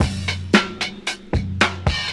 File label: Old School Break, Drums